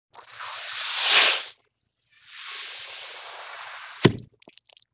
Wet Squoosh Pop; Vintage Cartoon; Hits & Tones

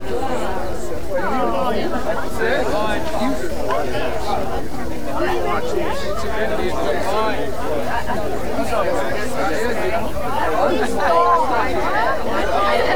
Crowd Angry Boos; Vocals & Wallas

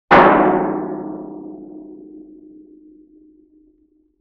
Oil Drum Hit Deep Sustain; Vintage Cartoon; Drums & Percussion

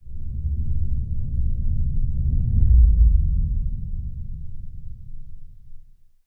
Sub Sonic, Horror; Sound Design

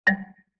Xylophone Chord G; Vintage Cartoon; Musical Elements

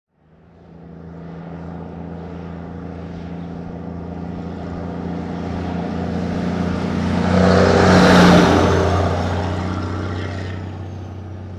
1954 Big Rig Ext Fast By; Period Vehicles

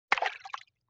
Water Splash Small; Vintage Cartoon; Foley

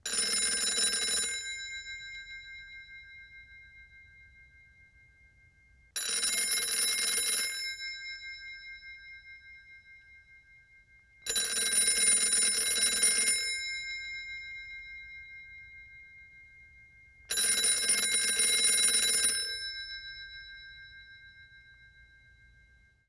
1940s Phone Ring; Period Devices

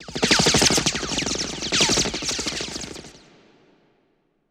Sub-Machine Gun Ricochets Multiple; Sub-Machine Gun Ricos Multiple

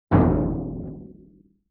Timpani Hit Bright; Vintage Cartoon; Drums & Percussion

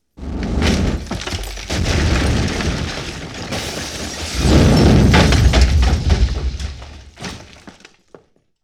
Explosion

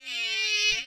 Evil Telepathic Girl, Vocals - Humanoid

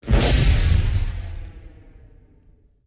WWII Explosion With Flare; Period Combat

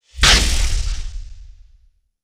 Flash Frame Crackle; Sound Design

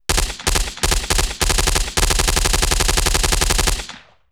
Machine Gun Bursts; Weapons & Explosions

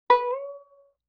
Violin Pluck Pitch Rise; Vintage Cartoon; Musical Elements

